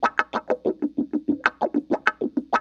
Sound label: WAH PATTE, Funk Gtr & Wah